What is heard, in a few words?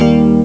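electric piano accord; Amiga Soundtracker Sample Packs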